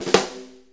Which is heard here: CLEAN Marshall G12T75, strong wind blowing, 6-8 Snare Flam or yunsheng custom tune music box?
6-8 Snare Flam